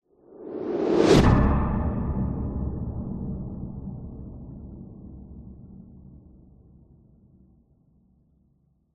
sound design whoosh horror fast heavy into metallic hit; zapsplat